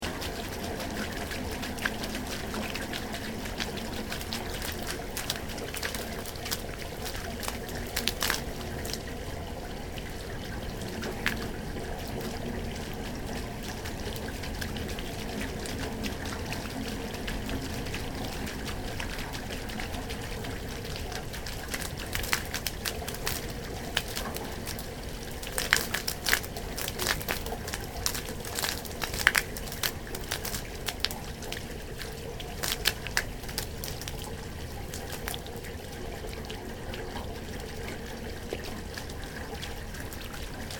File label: Water From Drainpipe Distant Thunder, Severe hail storm; Weather